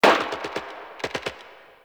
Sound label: Distant Gunfire